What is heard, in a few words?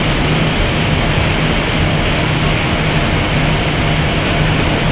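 ambience interior loop heavier; Tony Hawk's Project 8; XBOX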